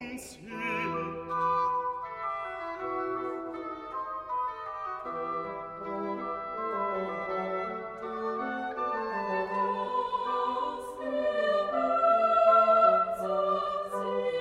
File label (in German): Choral; Er ist auf Erden kommen arm